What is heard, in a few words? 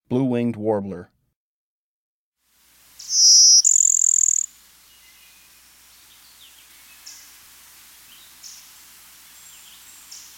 Blue-winged Warbler